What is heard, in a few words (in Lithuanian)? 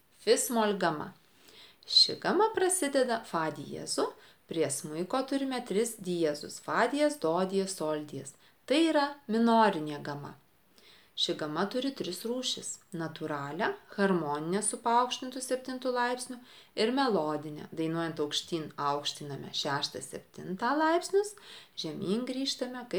Gama fis- moll